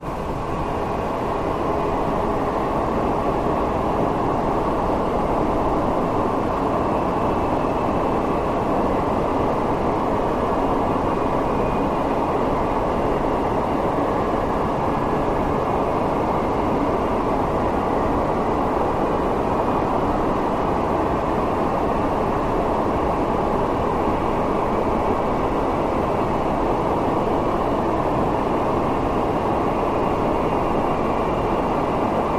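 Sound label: Ozone Steady Atmos Tone, air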